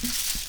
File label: lightning; WireChem